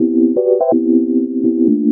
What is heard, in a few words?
house synth 125 A, keys